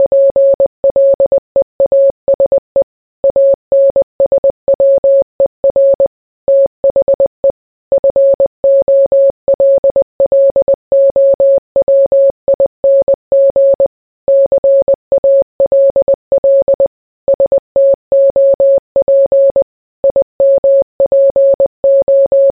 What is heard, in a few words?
Morse Code